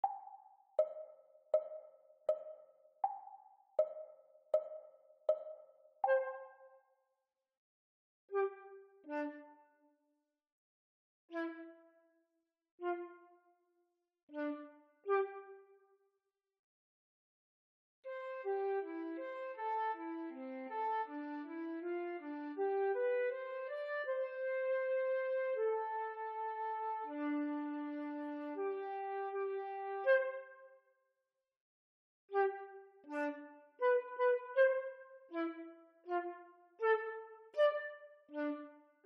Flute; Grade 1; Practise Speed

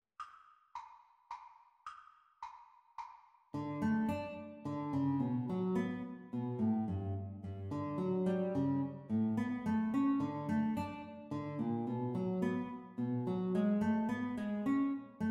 Guitar Duet Playalong Exam Speed

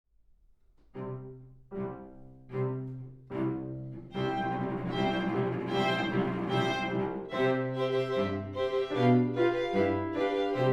Can Can with piano

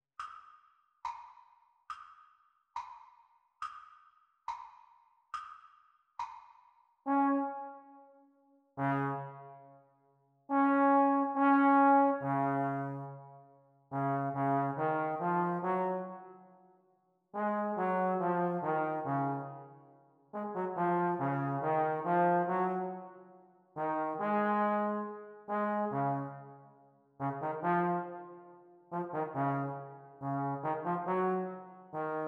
Playalong for Eb Horn Practice Speed

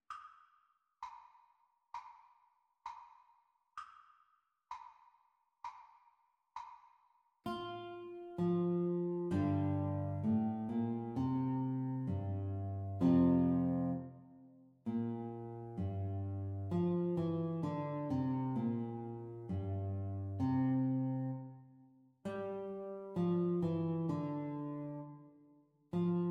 Guitar Duet Playalong Practice Speed

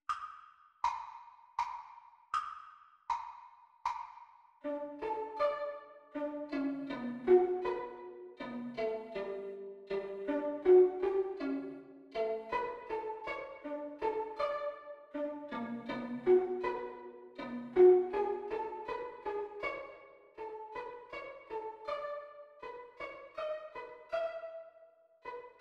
Violin Duet Exam Speed

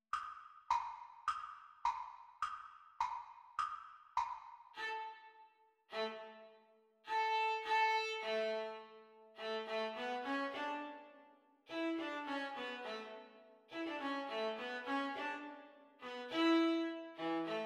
Viola Duet Playalong Exam Speed

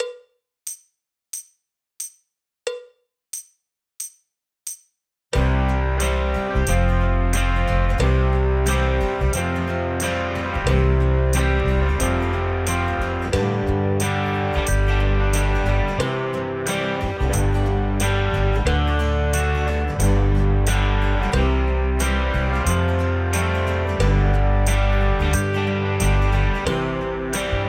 Drum Kit Playalong; MTB Exams; Grade One

